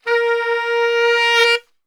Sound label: A#2 SAX; Giga Samples Collection; SAX SWL 140